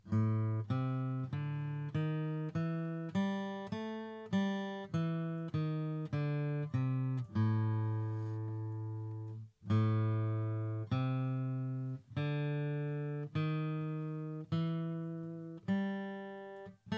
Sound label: A Blues Scale, guitar